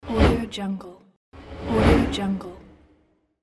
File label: fast car pass by